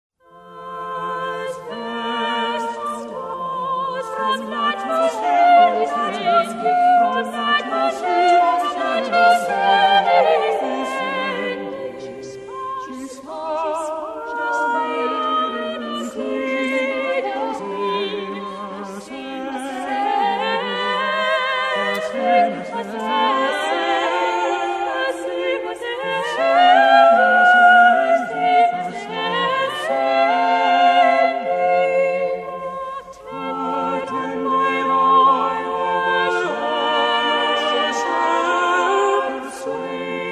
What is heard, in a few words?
madrigal